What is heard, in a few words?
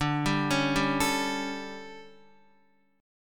D Major 7th Suspended 4th, Guitar; DM7sus4; arpeggiated; JGuitar